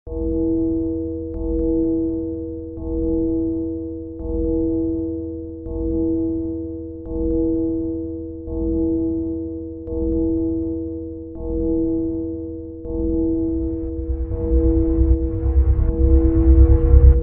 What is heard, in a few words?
Horror